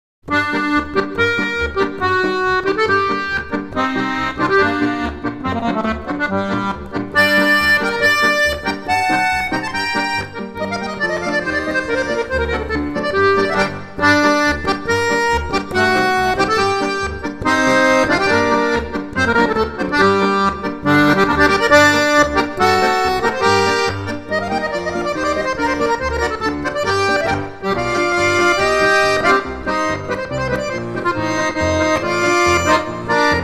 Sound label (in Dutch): Accordeonist Troubadour Balkan stijl